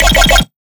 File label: Riddim Bass Hit; PacMan